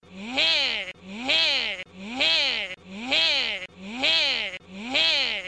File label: Alarm Slow